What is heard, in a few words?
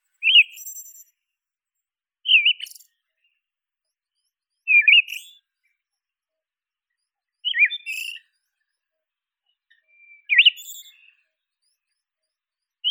Zoothera sibirica song; passeriformes; turdidae; geokichla sibirica; birds of the ussr